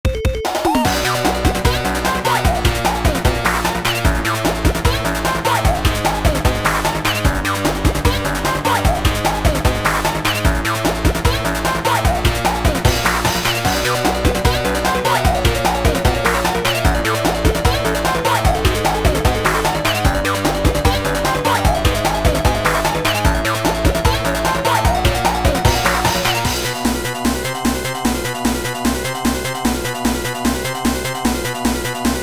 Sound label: Genesis Instrumentation (Instrumental); Every Generic FNF Sonic.exe Song With Genesis Instrumentation